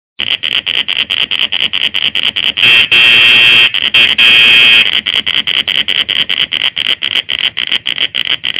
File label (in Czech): benzin, zvukový modul motor; krick; zvukovy modul motor benzin diesel houkacka